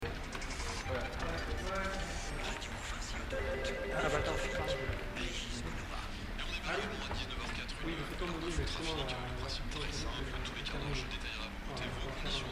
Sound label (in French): ambiance sonore